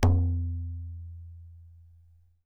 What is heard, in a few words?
PAKHAWAJ, DRUMS OF IND; AKAI S6000 CD-ROM; WorldSounds